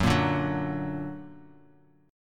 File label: Gb Augmented; 14,13,12,11; Gb+ strummed